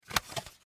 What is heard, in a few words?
Cd Jewel Case Open, Cd Case Open Dvd; Foley; Plastic; Materials